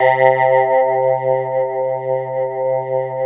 PPG C3; Sound Gallery - Charged

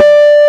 D3 N-HARM, GUITAR; AKAI S-Series CD-ROM Sound Library VOL-8

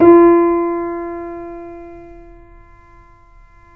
C7 PP, The Ultimate Piano Collection; East West

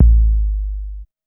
bass drum deep, techno trance drums

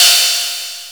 Electro Crash; Drum Hits; Crashes; Nu Dance Electro; Zero-G; Soundclash

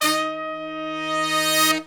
brass longs, Brass Sections, Horns; Roland LCDP06; R&R Horns; R&R Sect.sfz

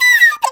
CHINA, Heart of Asia; Spectrasonics; CHINAFLT

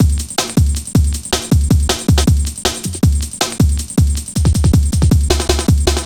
Drum Loops, 158.5; Jungle

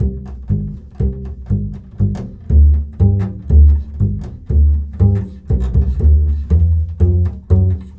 Bull Fiddle, Appalachian

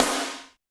Snare, Roland TD-20

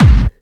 Bassdrums Clubby, Kicks; Vengeance; Essential Clubsounds Vol.2

